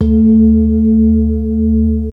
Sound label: Gong A, Drum & Bass Pads; Total Drum&Bass; 90 s Sample CDs